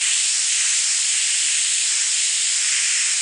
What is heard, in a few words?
spray